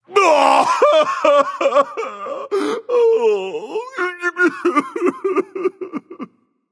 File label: boohoo; smite; kuzenbo